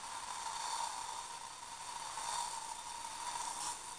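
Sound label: hair spray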